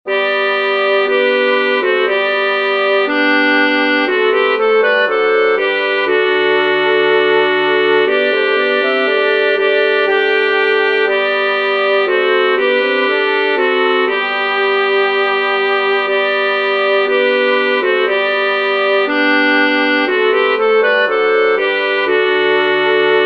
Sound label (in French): alte; Canticorum Jubilo; Concert du 13 décembre 2024 en l'Église St Pierre - St Paul